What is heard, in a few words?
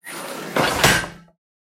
Iron Door Close; soundscrate